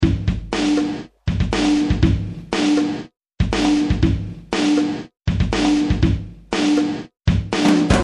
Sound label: Monsta Kit